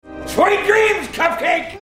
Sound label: taunt; taunts; stompinggrounds; props